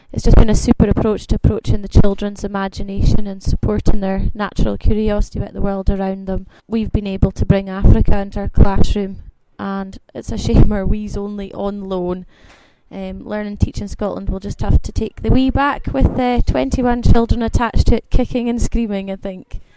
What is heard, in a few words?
kicking and screaming